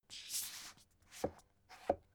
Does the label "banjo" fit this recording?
No